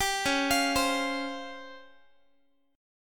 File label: Db Major 7th Flat 5th, Guitar; arpeggiated